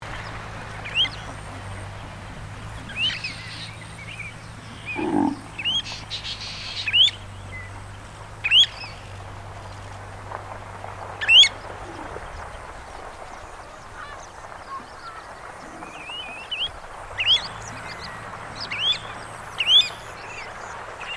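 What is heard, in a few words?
American golden plover voice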